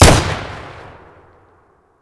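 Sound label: fire close, shotgun; weapons; BOITO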